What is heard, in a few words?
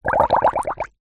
food drink bubbles blown through straw into water in plastic cup; zapsplat